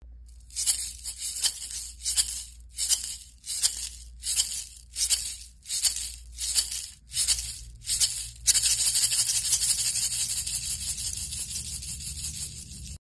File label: Shaman Shaker White